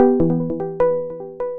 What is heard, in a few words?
short Music Loop; Deep Dark Fight